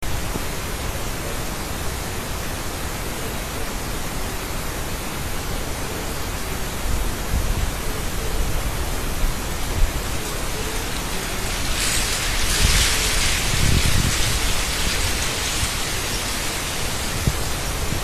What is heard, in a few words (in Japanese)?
yamabato; 社務所裏で。遠いのと笹の葉擦れで聞き難い、 鳴き声; 蘭梅山; 野鳥